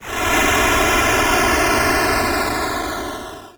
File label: engine takeoff